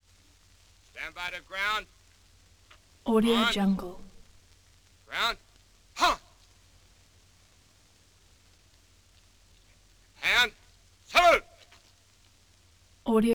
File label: troops train